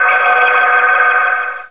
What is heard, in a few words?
key; Serious Sam II; Items